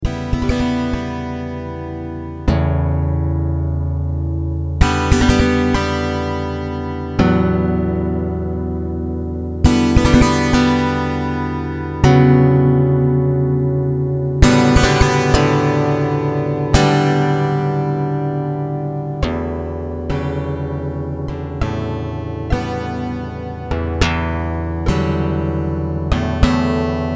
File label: Two Guitars Impro